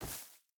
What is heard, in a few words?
footstep burlap grass run; Rust